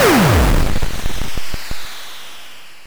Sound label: cannon, weapons